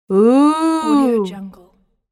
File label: female voice ooh